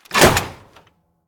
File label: close; hellblazer